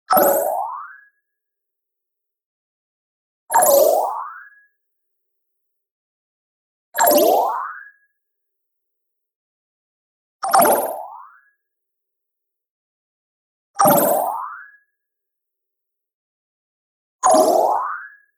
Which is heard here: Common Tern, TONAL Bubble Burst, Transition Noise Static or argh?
TONAL Bubble Burst